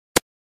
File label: Hitmarker Sound Effect; COD